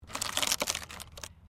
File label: WOOD PLANK TWIST